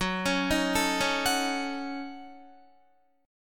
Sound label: Gb Minor 6th Gb down Guitar; Gbm6; arpeggiated